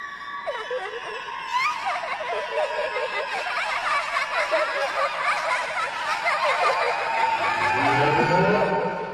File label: childish laughter; mlp; blanked applebloom